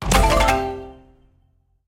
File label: explode wild multi; serengetikings